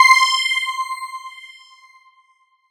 SY PHASOR; ModelCycles

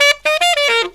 SAX

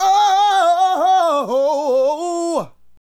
Adlib, Hip-Hop and RnB Vocals

